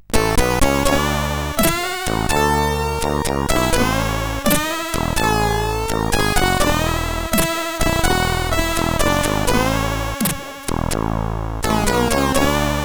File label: Country, sid CSG8580R5